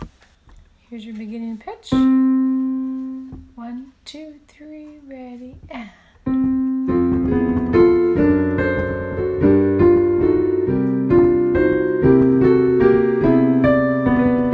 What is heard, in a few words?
Goodnight Accompaniment